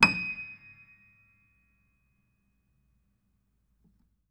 Note High, Piano; Piano Miscellaneous

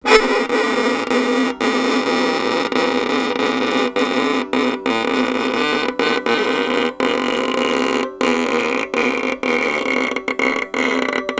Violin Extended Technique

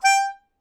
Melodica Short; Short Notes Chromatic

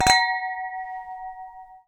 Water Clink, Glass Clink In Water

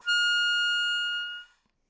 Melodica Long, Long Notes Chromatic

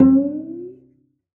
Cello D Bass

